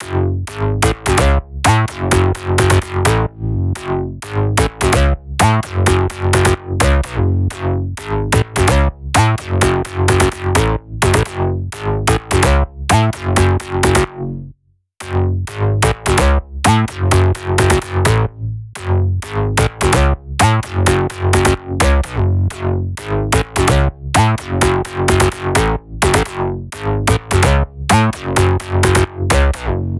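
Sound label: 128BPM Bass Full Processed Dry SC, F# minor; Demo Constr Kits; Let's Work It Kit; Bassline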